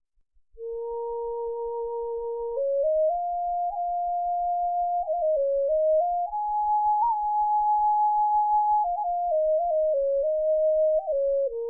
Medium Bb Bubinga Drone, flutes; FlutesDrones

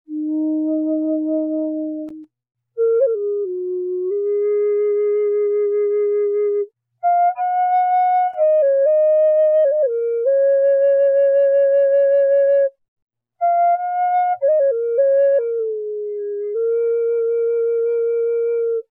Bass Eb Zebra Wood, flutes

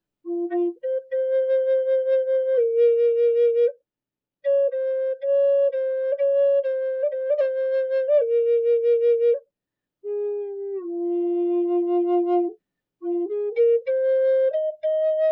Large F Eastern Cedar, flutes

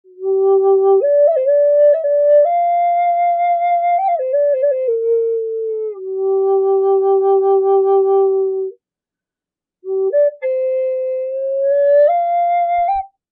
Large G Mahogany, flutes

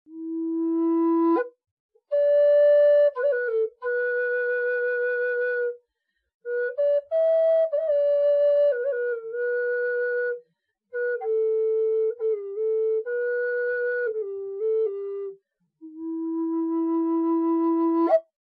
Low E Cherry, flute; Flutes

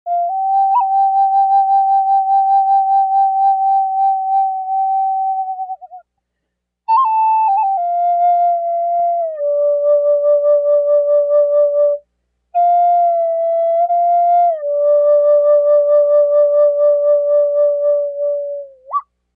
Eastern Cedar Small D, flutes

